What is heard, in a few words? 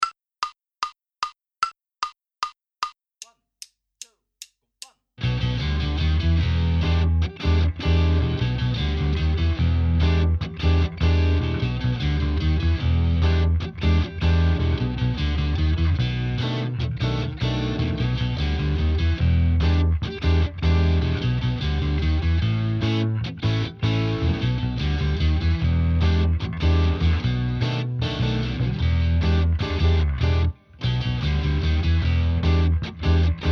Minus Drums; Inst Ensemble